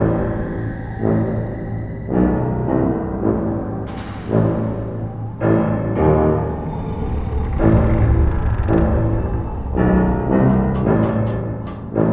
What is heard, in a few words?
Interior Sneek; Shrek 2